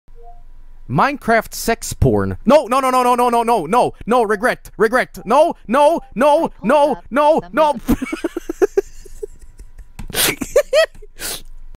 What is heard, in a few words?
regret